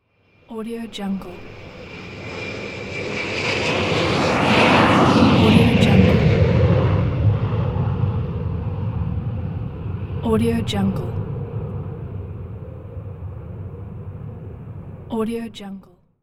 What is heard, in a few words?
passenger airplane take off